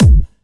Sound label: Kick, Drums; Bonus Drums